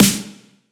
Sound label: Drop snare